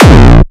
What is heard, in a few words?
Distorted Kick